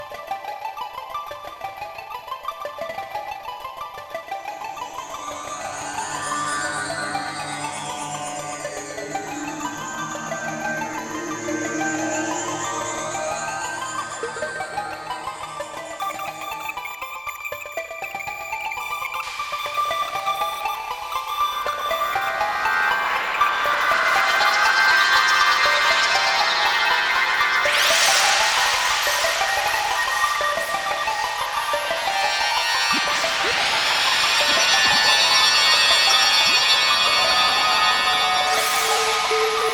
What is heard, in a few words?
Vortex